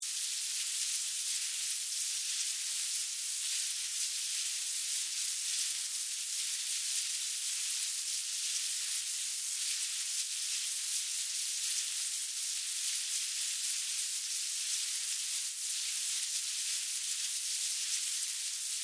Hive Light Activity, Animal, Hive, Light, Activity, Bugs, Movement, Insects; Blast Wave